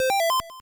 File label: Stereo Pause; Abadox; NES